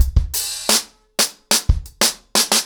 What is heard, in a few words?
90 BPM, acoustic drums, drumloops